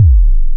Goo Kick, Drums; Kicks

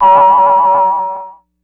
Moog one shot, Synths; Sonic Foundry (Sony Creative Software); Electronic Point Blank

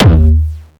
Jump kick, Jump hardstyle kick

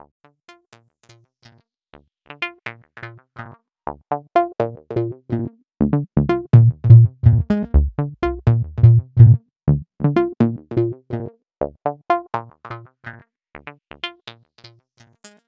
Hopalong, Modular Electronica Synths; Sonic Foundry (Sony Creative Software)

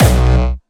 Distortion Kicks Kick B1; Bassdrums; Vengeance; Essential Clubsounds Vol.4; Bonus TonalHS Kick

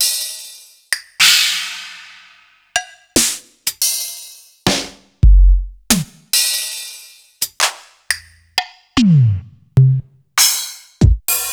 Casio CTK-5000, Samples Sliced

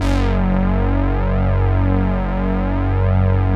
Deep Bass f#1, Korg EX-800

